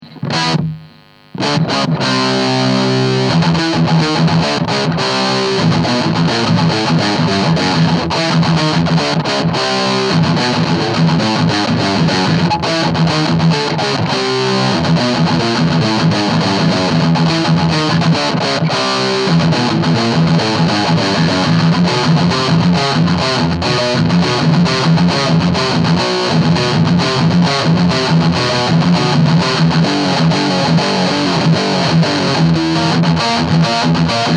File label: lead; lampes